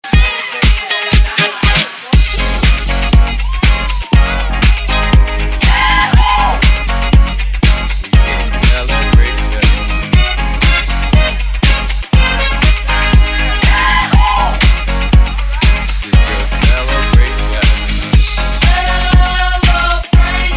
Classic 80s ReDrum

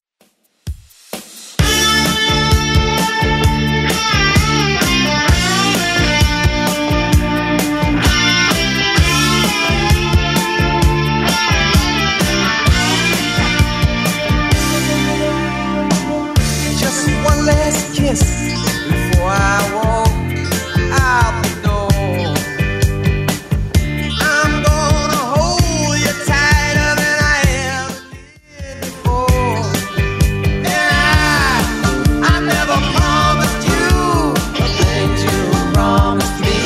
70s Rock Redrum 130bpm

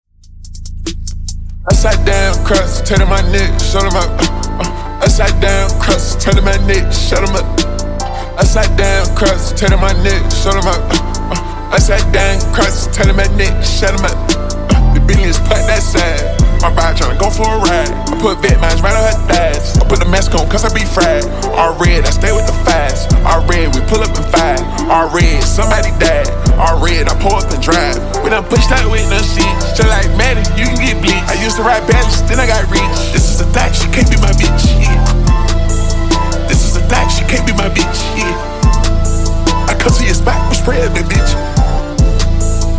Funk 71Bpm

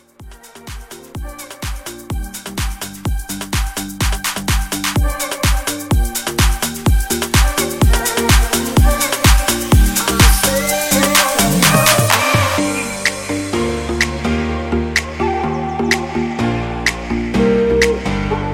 Club Mashup 126 CLEAN

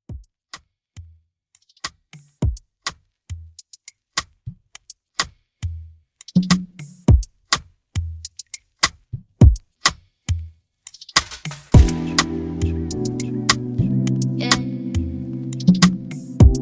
Club Intro Out

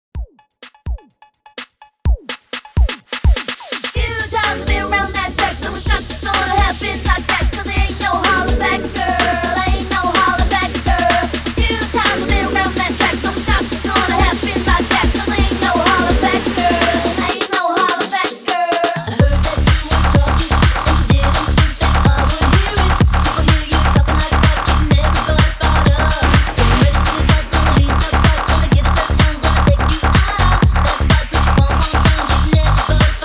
126 BPM; MASH UP

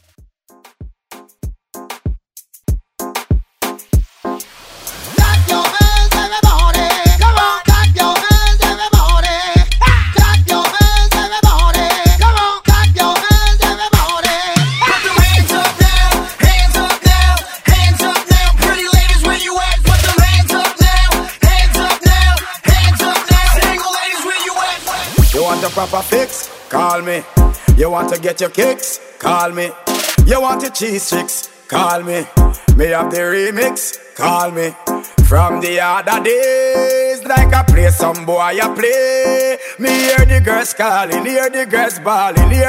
Dancehall Hype

